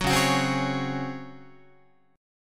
Db Major 7th Suspended 4th, Guitar; 9,9,9,10,12,12; 4A,4E,5A,5D,5G#,6C#; strummed; chord shape

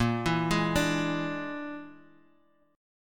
Bb 7th Flat 5th, Guitar, Open F; 10,11,8,9,x,x; Bb7b5 arpeggiated; JGuitar

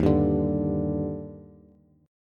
Eb Major 7th, Bass; EbM7; strummed; JGuitar

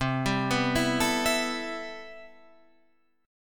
C Major 13th C, Guitar Open F; CM13 arpeggiated

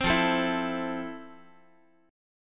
F Major 7th F, Guitar; strummed

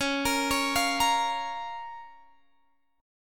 Db 6th, Guitar, Open G

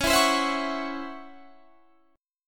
Db Minor Major 11th Db Guitar Standard; DbmM11 strummed; JGuitar

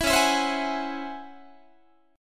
Db-Minor Major 11th Guitar, DbmM11 chord; strummed; JGuitar

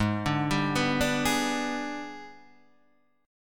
Minor Major 7th Flat 5th; G#mM7b5 Chord {4 5 5 4 3 4} arpeggiated

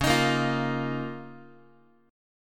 Db 6th Add 9th Db, down, Guitar; strummed; x,9,6,8,9,x; JGuitar

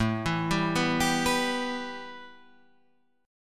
A 7th Suspended 2nd, A, down

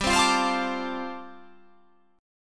G 9th Suspended 4th

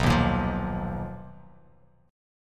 B Major Sharp 11th B, Bass Standard; BM#11 strummed; chord shape; JGuitar

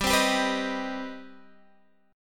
C Minor Major 7th G

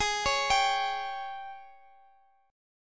Gsharp Major 7th Suspended 4th, Guitar, Drop D; x,x,x,13,14,15

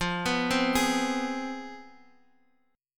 C Major 7th Suspended 4th Sharp 5th, F, chord

